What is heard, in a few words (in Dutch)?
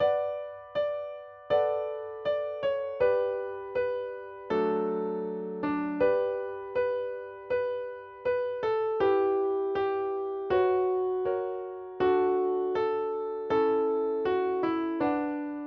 voorspel